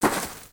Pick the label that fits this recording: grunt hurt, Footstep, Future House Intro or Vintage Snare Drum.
Footstep